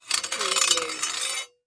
Sword Drag Movement

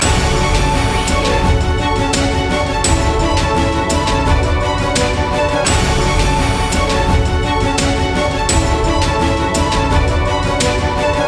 besieged loop

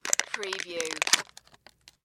Wrapper Open Plastic; Sound Effects Bible

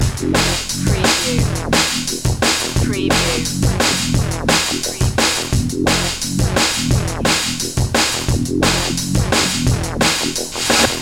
stimulation loop

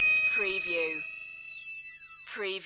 Hip Hop Synth Licks 90 BPM - E

